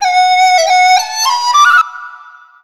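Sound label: CH FLUTE, Chinese flute; Soundscan vol.60; Asian Traditions; AKAI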